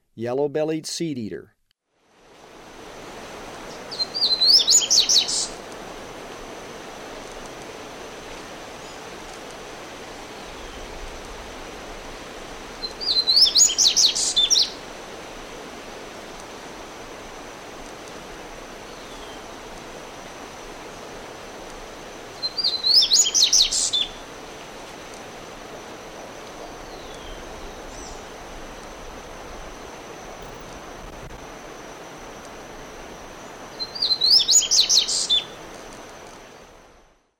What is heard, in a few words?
Yellow-bellied Seedeater; S. America; Eastern Lowlands